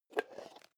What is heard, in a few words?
plastic bowl small open soft